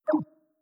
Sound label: muting; Microsoft Office; LYNC